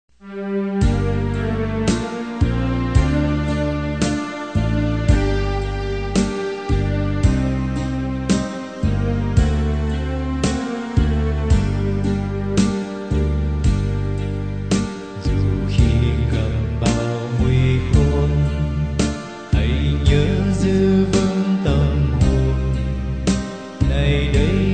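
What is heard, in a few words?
soprano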